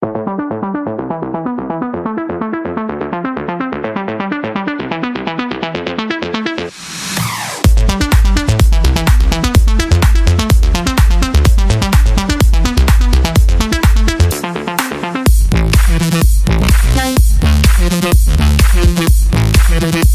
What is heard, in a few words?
Alpine Arps; AUDIOTENT